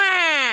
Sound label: wah; waluigi